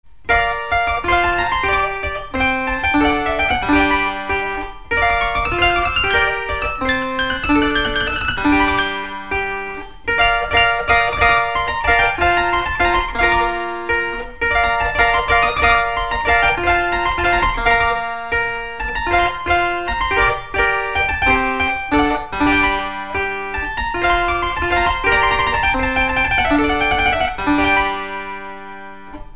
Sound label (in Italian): spinettina